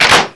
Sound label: spas cock forward; tf2classic